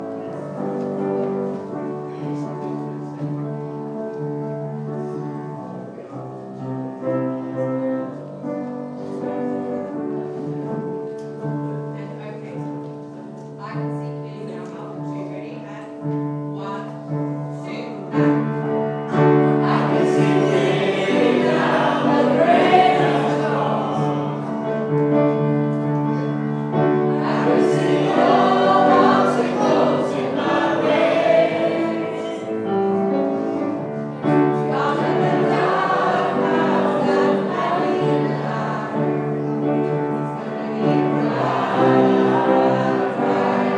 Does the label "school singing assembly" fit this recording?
Yes